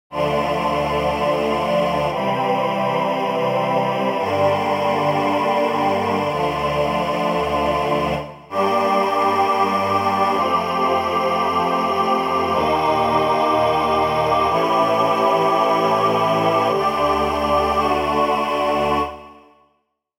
Choir, struck instrument simulator

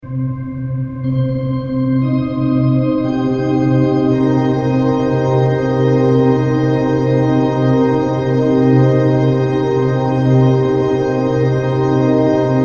string model

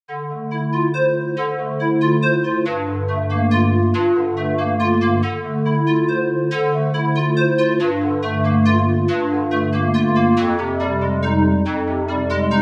Noise Square, struck instrument simulator

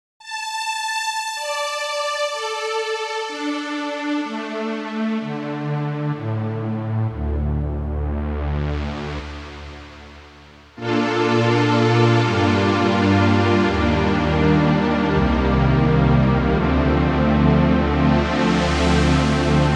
supersaw

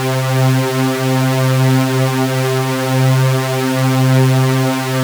supersaw

